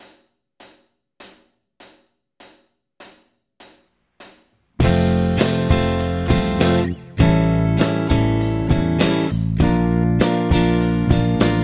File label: full speed, blues guitar